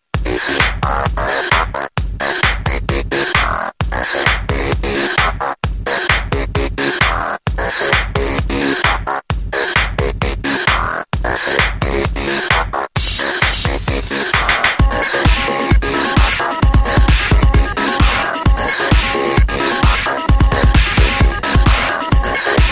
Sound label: electro shifts, ringtones